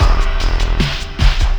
phunk Stack; Sonic Foundry (Sony Creative Software); Ugly Remnants VOL-2

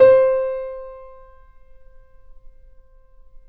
Mp C 6 off, Grand Piano off mic; AKAI S6000 CD-ROM - Volume 2

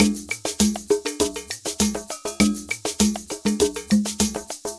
Ensemble, Latin Percussion

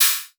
hata, hithat

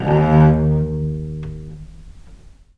VIOLE MUT D#1; Historical Instruments; USB Soundscan vol.23; AKAI

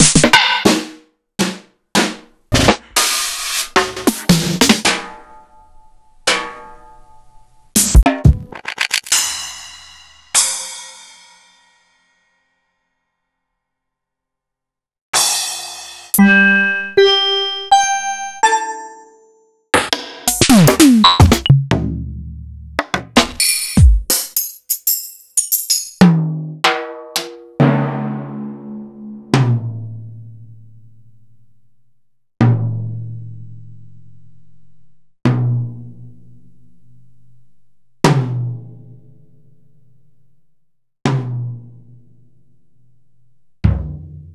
EMU PX-75

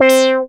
lead C4, EMS VCS3; Polyend; Simulacrum Sounds; 1 Shots; Synths; Leads and Keys

